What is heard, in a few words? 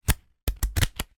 foley cheque book pages flick through